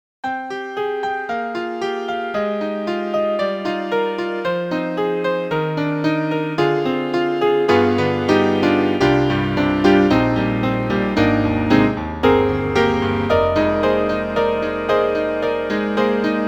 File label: Bright Acoustic Piano; Apple; Quicktime Built-in